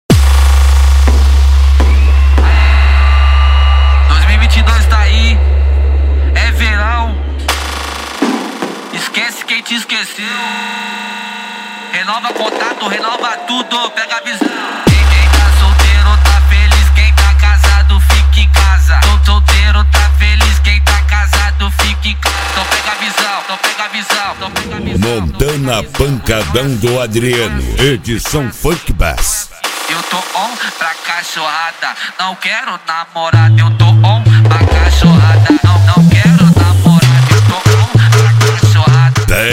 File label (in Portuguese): pancadao funk bass